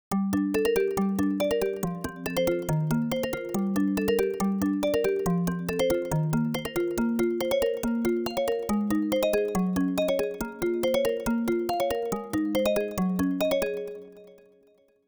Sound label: fluent, ringtones; OnePlus 5